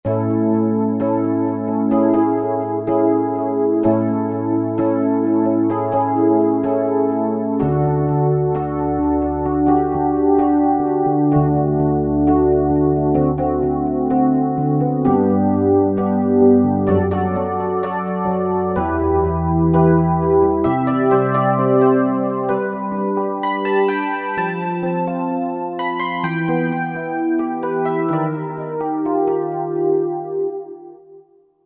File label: D-50 Rhodes